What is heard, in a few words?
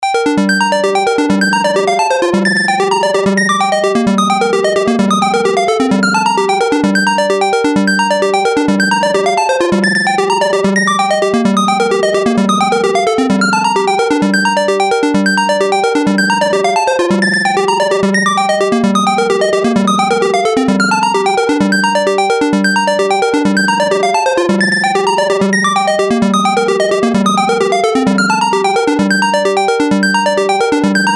JX3P House Airy Pluck dry